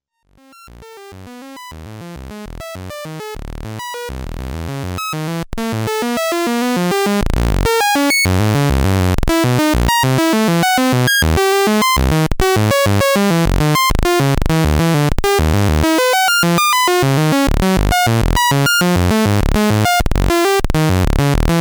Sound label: Ramp Wave; analogsynth